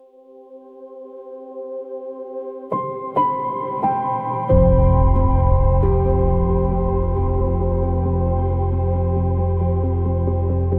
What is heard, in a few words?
Oceanic Drift, ambient ocean music